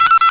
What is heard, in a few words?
blip